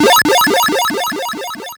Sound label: Stamp; BOMBERGIRL RAINBOW; Arcade